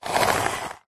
table tennis portable retractable net retract close plastic housing